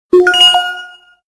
Notification Default; Zen Admire Swadesh

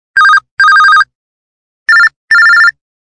Move Bell; KV920; Simple

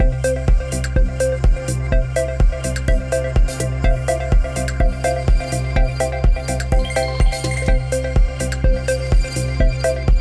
Chic Atmosphere, alarms; LG K83 5G

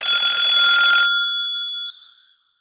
Telephone

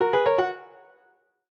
Run, notifications; Samsung; Galaxy J3 Prime

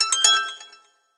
Highwire, notifications; Pantech Pocket

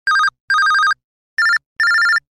Single Tone

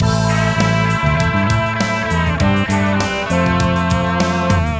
Classic Rock, ringtones; Google; NewWave Labs

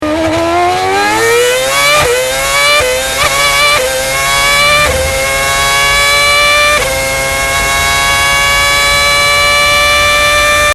Acceleration; Red Bull MOBILE

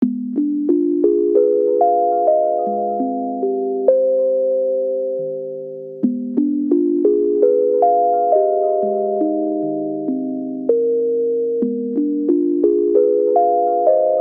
ringtone cosmos; The Light Phone II

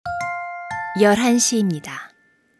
Hourly Alert; SKY; IM-U680L; System Sounds

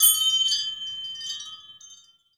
Chimes; Chimes mess; Sony Ericsson; PlusFourSix

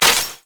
delete, ui; ZTE Nubia-Z5S-Mini

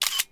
camera click

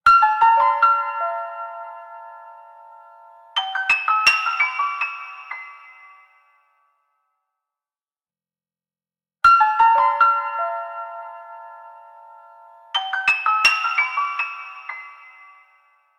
Cold Piano, alarms; Yota Yotaphone-2

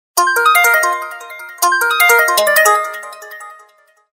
Ringtone, Samsung GT-C3510; Corby Pop Genova

